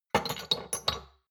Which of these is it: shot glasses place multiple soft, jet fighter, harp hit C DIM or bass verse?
shot glasses place multiple soft